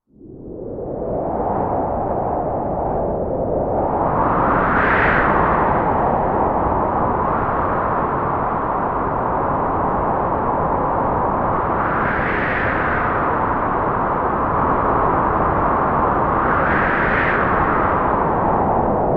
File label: Synthesizer Wind, Retro whoosh sound of a white noise synth wind; Whooshes (Sound Effects:Sound Design)